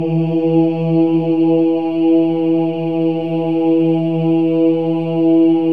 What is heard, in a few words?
OHS, Symphony of Voices; Spectrasonics